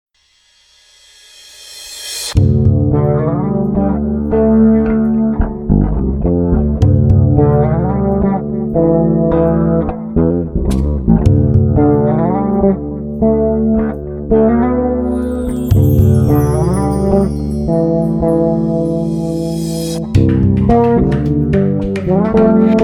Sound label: me-20b patch; Fretless sound, perfect for finger picking and use of rear pickup.; ME-20B Bank 2, No. 2